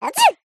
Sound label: cartoon character cute high pitched sneeze